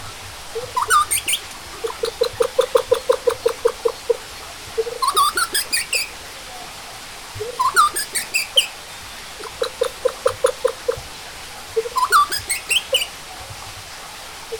capercaille chirping, Great place to record the natural habitat of a male and femaile capercaille couple